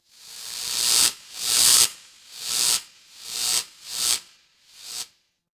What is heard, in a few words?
fire electric zap